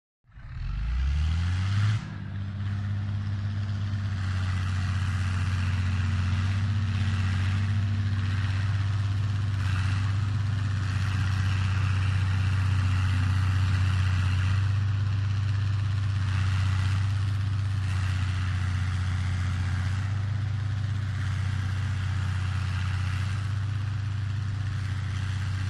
Bulldozer; Bulldozer Start Up In Mid Shot, Comes Past Mic, Stops And Switches Off In Mid Shot.